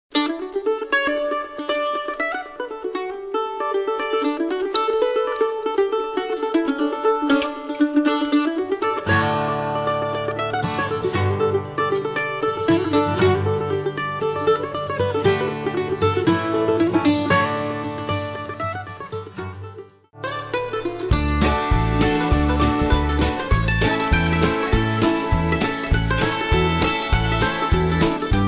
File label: Bluegrass; on hold message music